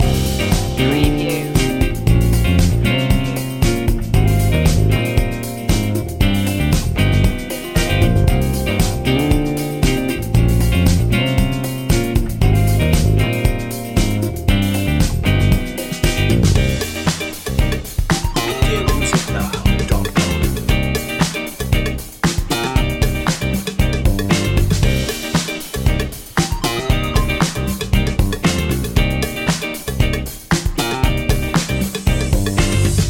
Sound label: soul fusion loop